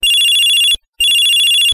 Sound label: Ring